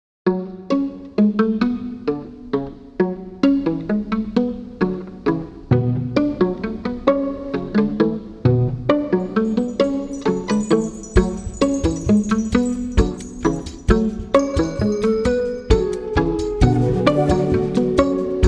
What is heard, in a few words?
Plucky; tfos-music